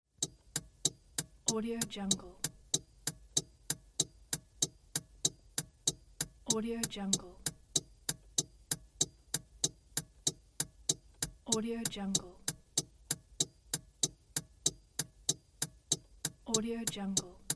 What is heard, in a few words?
car turn signal